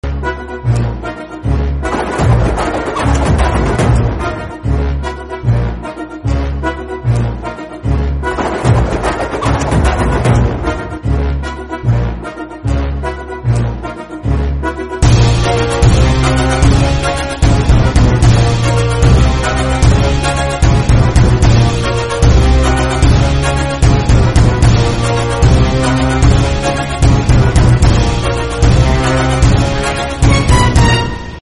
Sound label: bgm theme; rrr